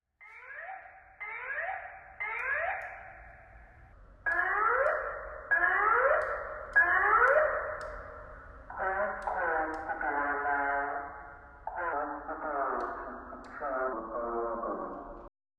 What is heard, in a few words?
door alarm filtered